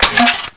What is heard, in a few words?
GREN ON; CHASM